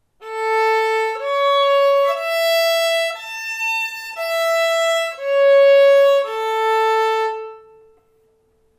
la arpegio, escalas; mi primer libro de escalas

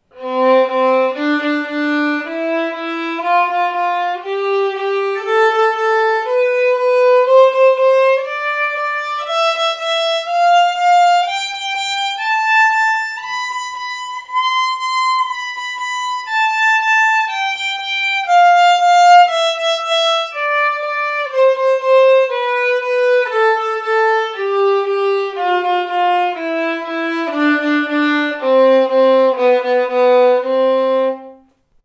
do ritmos, escalas; mi primer libro de escalas

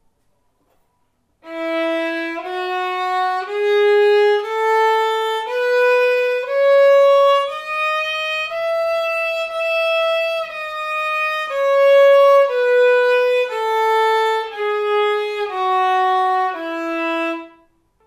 mi escala, violin; mi primer libro de escalas

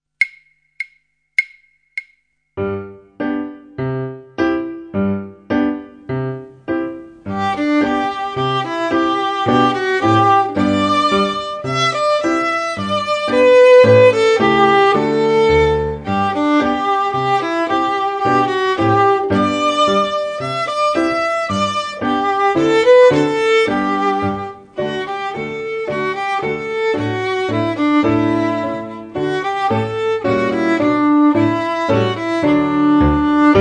Arco de violín violín

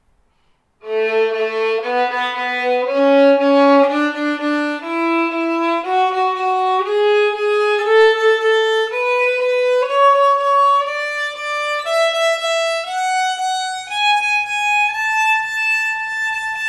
la ritmos, escalas; mi primer libro de escalas

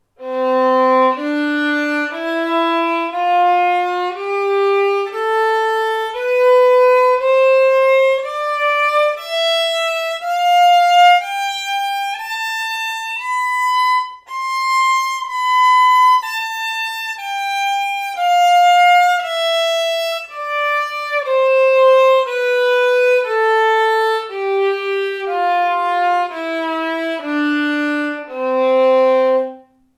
do escala; mi primer libro de escalas

